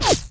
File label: bullet whiz